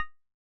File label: hud location